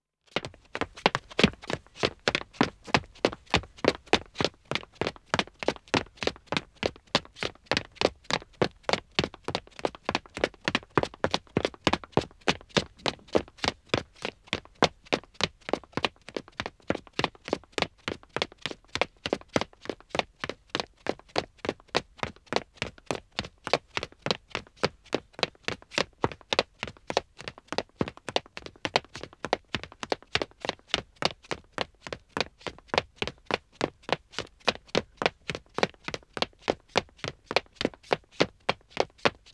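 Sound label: Wood (Dead Acoustic) Running 2 men, Footsteps; BBC Sound Effects Library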